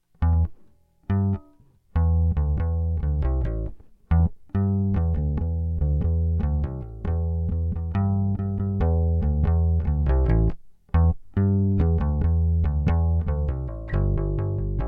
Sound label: Bass Riffs